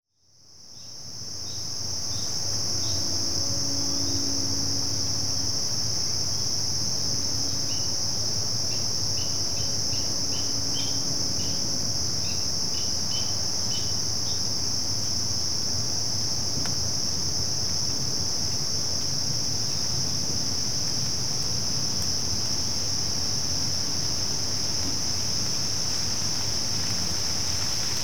peeper rain call 70 deg 9am, little spring peepers